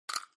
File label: dice ui, backgammon